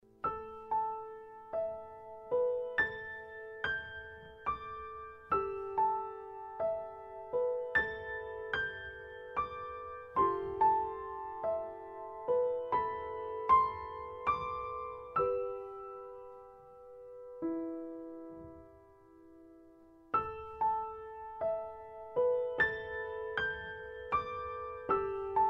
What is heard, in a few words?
quiet, concert organist